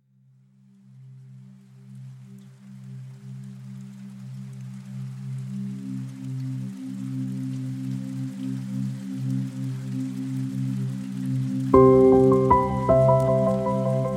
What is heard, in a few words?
tranquil rain instrumental